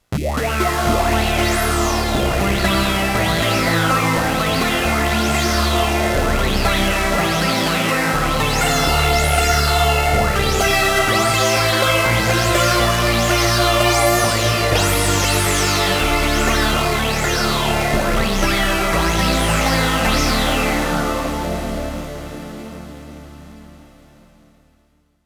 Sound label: Mini LP OB Notch; Solaris; MiniLP and the OB Notch filters; John Bowen